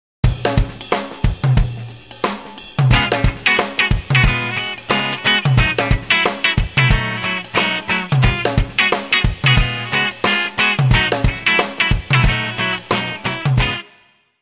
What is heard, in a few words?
Rhythm Guitar